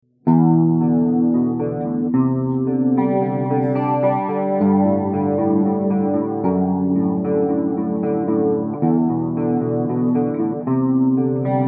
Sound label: guitar clean from amp method